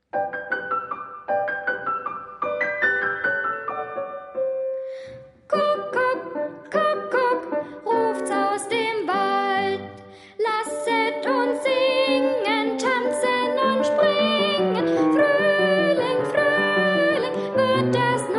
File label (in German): Kuckuck Kuckuck gesungen; Liederprojekt